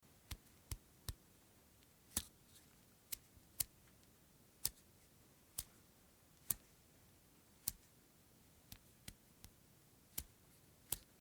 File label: lighter flick